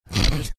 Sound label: snorting